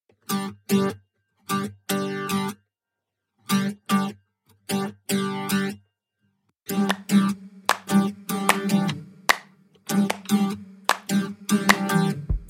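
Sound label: background music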